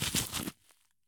soil stop; Escape from Tarkov

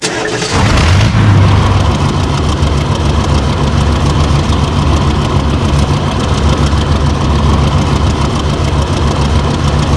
BMP Engine Idle; DCS

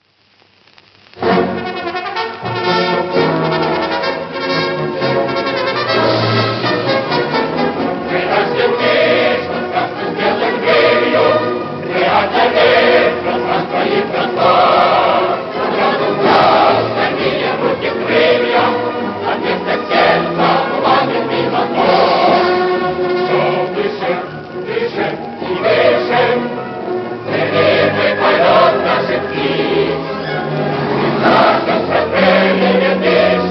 Music March; DCS

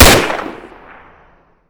Inf Weapons; Red Orchestra 41-45

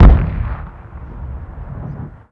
expl dist

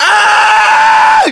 melee attack; english

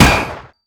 Projectile Sounds; Red Orchestra 41-45

